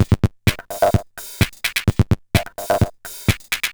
Bent TR505 (128BPM), Circuit Bent; glitch

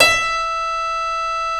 GRAND FF E4, PIANO

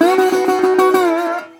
Blues Guitar; ILIO; Fretworks - Blues Guitar Samples; 120 SIMMS LICK

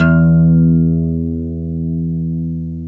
GTR 6STR E, Steel String; Roland LCDP02 Guitar and Bass

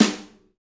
New Brush Snare; Snares

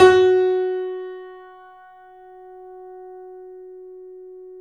3D Boesendorfer, Pianos, F#; E-MU Producer Series Vol. 5; 3-D Audio Collection

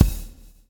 swing

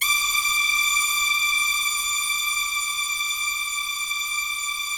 BIG ORCHESTR, Yamaha VP-1

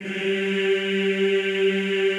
EEH G2, Classical Choir; Best Service; Extended Classical Choir

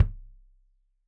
Kik Stereo; Deeply Sampled Drums; SMDrum

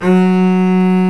BASS F#2; E-MU Producer Series Vol. 2; More Studio Essentials; Composer; Solo String Combo

